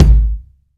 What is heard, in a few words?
small reverb kick sound g sharp key; Mono